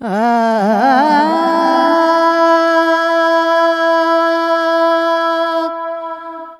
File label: Improv, Vocals Male; Sonic Foundry (Sony Creative Software); World Pop